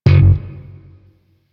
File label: Shawzin Slap Oct One F Sharp, Chords; Music; Warframe; Sentient Shawzin